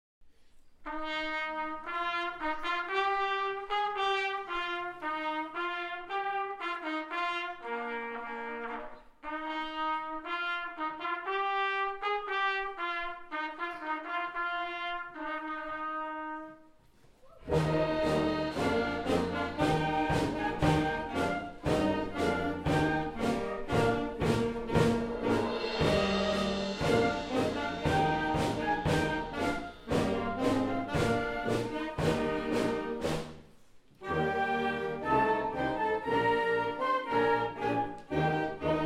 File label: Trumpet Voluntary; musikabend 2026 impressionen